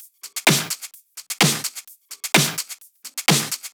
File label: Drumloops 128BPM